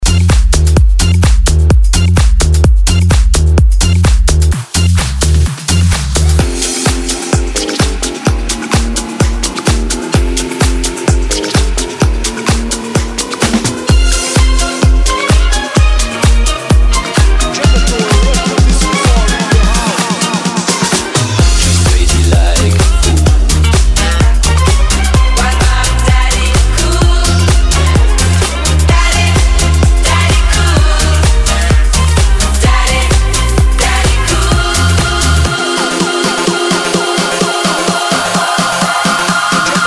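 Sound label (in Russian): классическая музыка диско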